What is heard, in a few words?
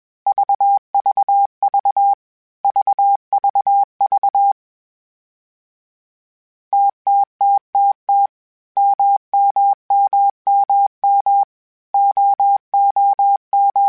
TMO0, Morse Code; HamRadio; Basic Sets